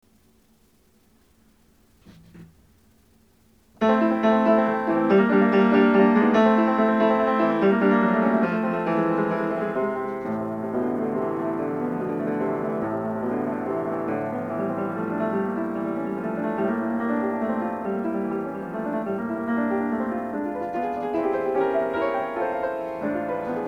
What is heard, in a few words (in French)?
pour le piano